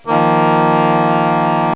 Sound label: chord